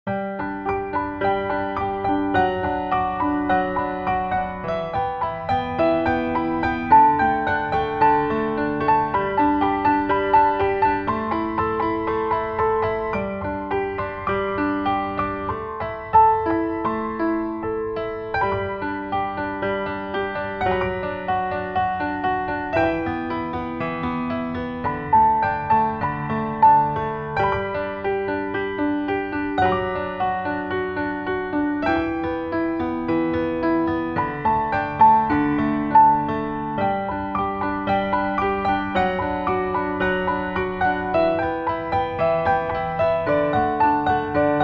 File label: piano